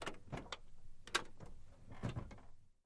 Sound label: Camaro Door Open Ligh, chevy camaro